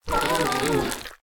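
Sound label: enemy Homunculus Shivering Death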